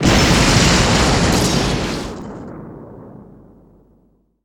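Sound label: Explosion Large; Destruction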